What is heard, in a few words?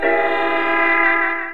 Oops Loud